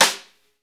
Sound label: snare, Roland